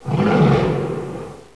Bull Attack

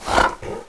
Ogre Attack

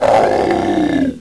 Black Skull Attack

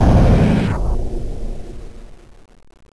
Swell Life

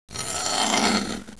blood

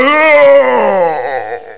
Giant Die

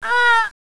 Female Scream

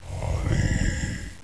death attack

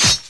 Short Blow

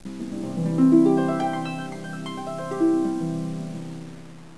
Harp

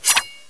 Ghost Attack

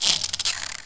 Scorpion Attack

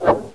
Swing Weapon

